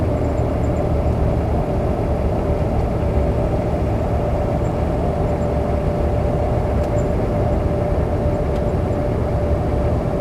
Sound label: MOTOR RPM 4X4; SIMUZENSOUND